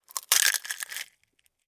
Insect, Eat, Bite, Bug; Animals:Insects; Insects Eating; Blastwave

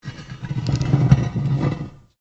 METAL ON METAL LARGE SCRAPE; Sound Effects:Materials

